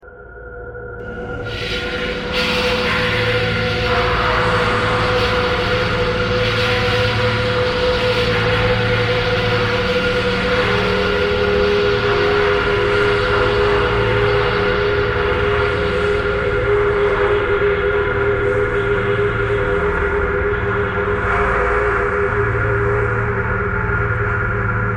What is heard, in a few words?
thread pentatonic